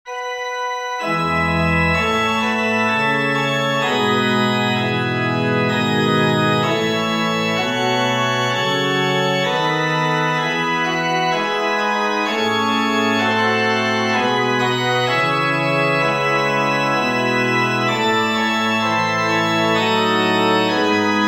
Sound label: introit